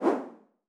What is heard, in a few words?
Whoo